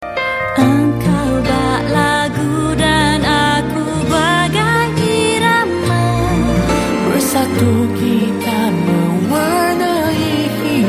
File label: melody